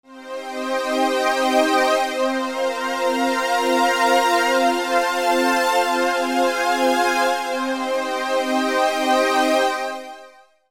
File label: Nord Lead 2